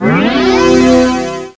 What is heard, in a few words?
Extra Ship; Swoop 1.0.0